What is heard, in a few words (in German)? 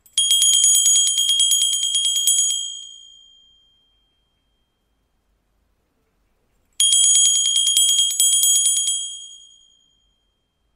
klingelton christkind glöckchen